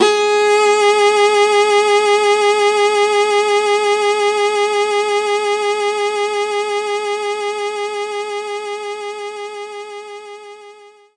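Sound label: Viola G sharp 4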